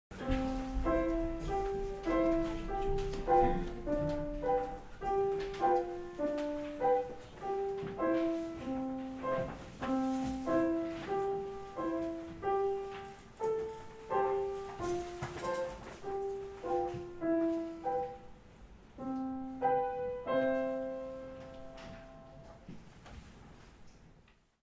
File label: Polka; Kabalevsky